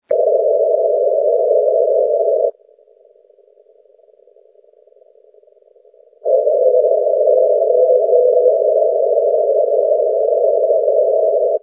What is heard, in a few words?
echo 3cm